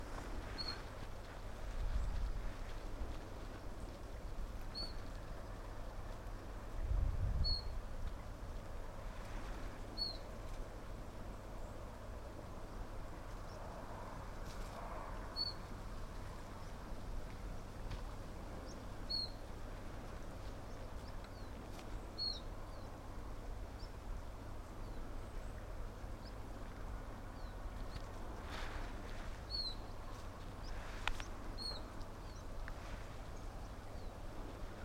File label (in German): taigazilpzalp